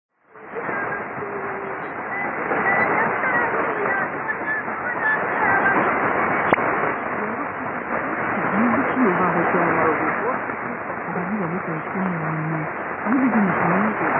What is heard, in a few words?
6070kHz Belarusian Radio; ベラルーシ